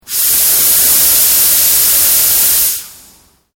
MACHINERY PRESSURE VALVE RELEASE MEDIUM; Industrial machines; Machinery (Sound Effects:Machines)

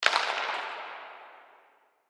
Hockey Stick Hit, Hockey Stick Hit Puck; SPORTS; SoundEffects-Blastwave

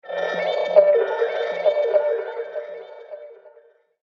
Sonic Brain Waves, sci fi; science fiction sound effect; star wars / trek style

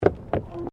Tram Horse Drawn Step Wooden Bridge; Horse drawn tramcar; Carriage Sound Effects; Carriages (Sound Effects:Vehicles)

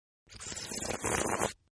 Slurp Female, Drinking; Human; Partners In Rhyme

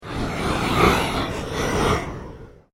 PULSE SHORT SWEEP; Sound effects created by manipulating other sounds; Sweepers; Sound Design

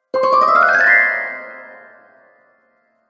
Sparkle Gliss Rising Magic, Magic Sound Effects

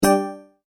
multimedia tone exclamation; McKinney Sound

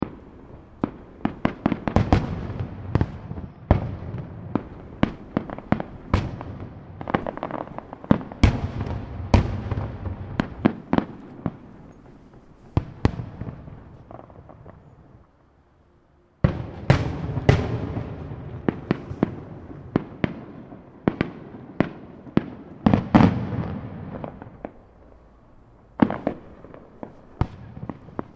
Fireworks Display; Backgrounds; Fireworks (Sound Effects:Backgrounds); CraftedSignals

